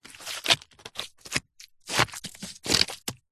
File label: wood stick multiple pokes stabs into sludge wet mud squelch; foley